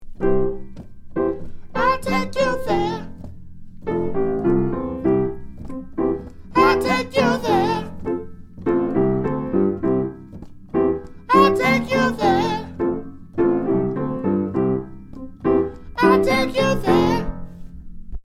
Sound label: Soprano